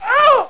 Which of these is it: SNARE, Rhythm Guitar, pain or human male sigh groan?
pain